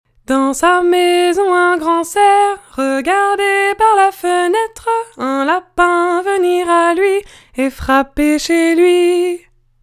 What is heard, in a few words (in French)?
grand cerf, intervalle